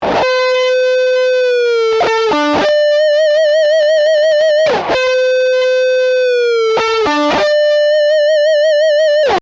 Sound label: Prebend Example